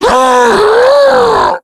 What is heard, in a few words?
hulk pain fire